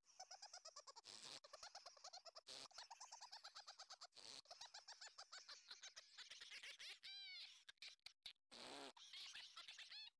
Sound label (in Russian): smeh; Смех